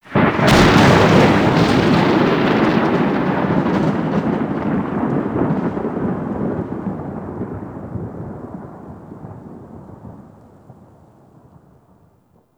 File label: Shipyards Storm Thunder; Exterior; Warframe; Grineer Shipyards